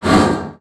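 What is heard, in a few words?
Collision Interior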